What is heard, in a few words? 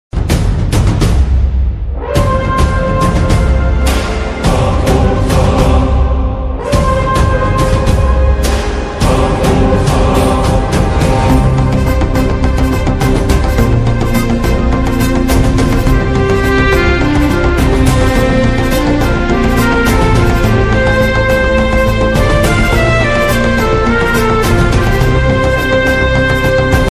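love background music ringtone; shaakuntalam; samantha ruth prabhu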